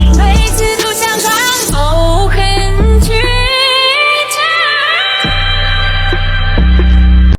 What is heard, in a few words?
singing